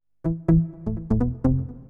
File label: SYNTH HOUSE 125 A; eJay; Keys